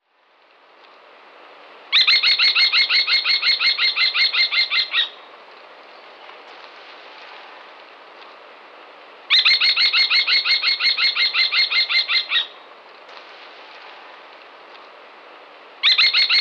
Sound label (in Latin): Falco tinnunculus